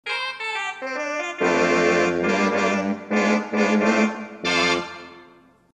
4' Tibia 8' Trumpet, theatre organ

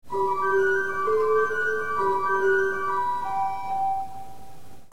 gt flute, theatre organ

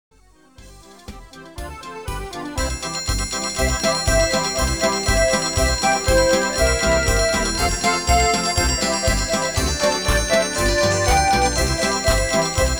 Jingle Bells, theatre organs

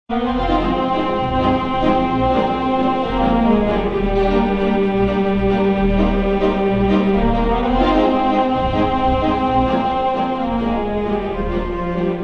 theatre organ

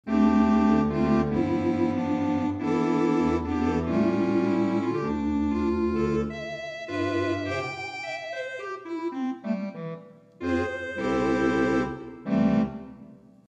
8' Tibia 8' Oboe, theatre organ

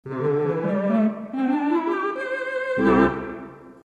8 Tibia 8 String 8 Vox 2 2/3 Tibia, theatre organ

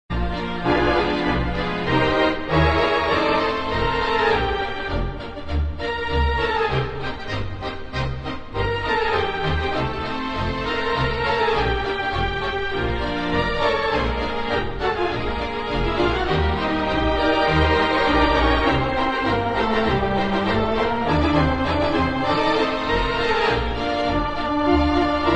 quicksteps, theatre organ

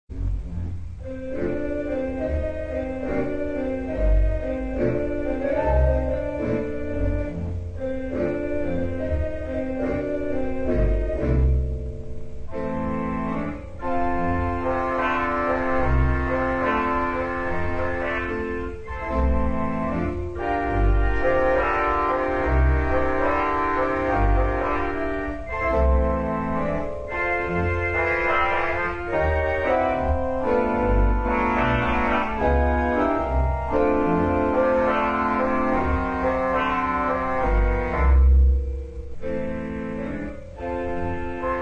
theatre organ

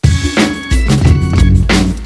rpm, Hammond

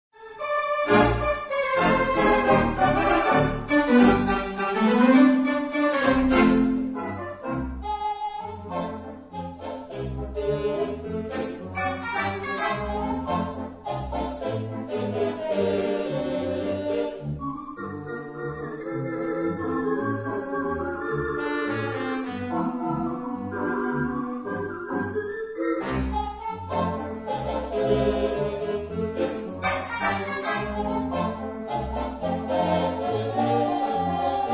dixie, theatre organ

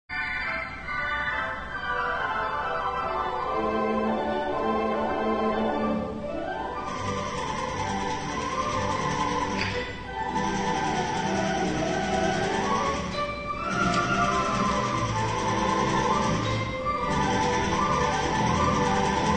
typewriter, theatre organ

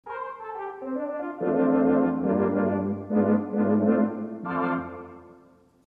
8' Diapason + 4' Tibia, theatre organ

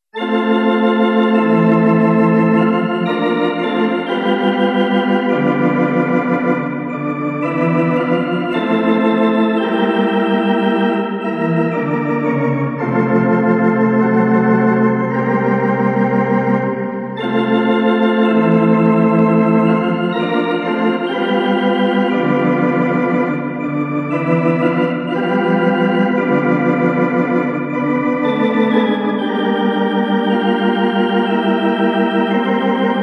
Sammy, theatre organs

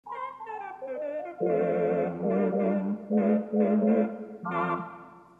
4' Tibia 8' Vox, theatre organ

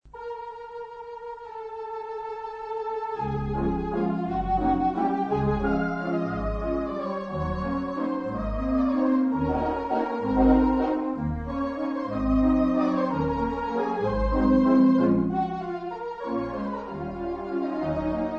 rockbyebaby

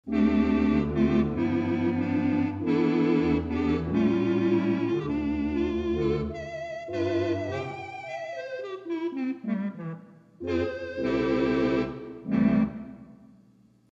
8' Tibia 8' Brass Sax, theatre organ

